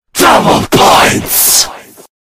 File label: double points sound effect; Call of Duty World at War Nazi Zombies